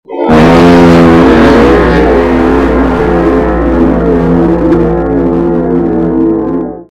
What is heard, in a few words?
big fard